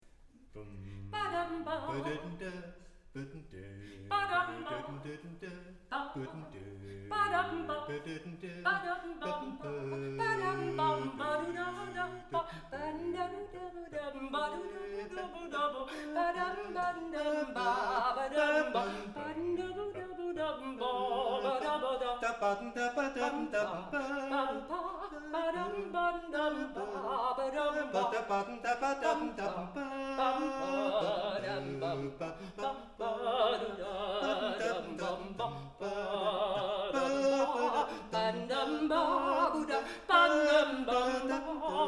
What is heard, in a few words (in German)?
Duett